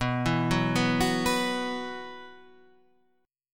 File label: B Suspended 4th B down, chord; Bsus4 arpeggiated; JGuitar